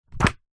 book crossword thin pages drop or throw down on soft surface; household